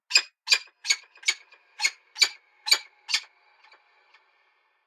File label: ambience bad birds; env s8conquest; smite